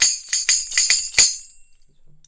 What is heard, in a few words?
CYMB, Indian Traditions; USB Soundscan vol.45; AKAI